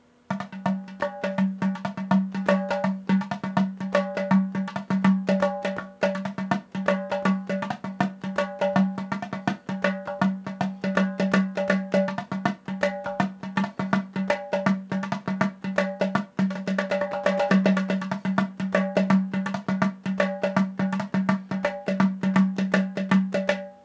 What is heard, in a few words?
Basic Hand Drum, gourd drum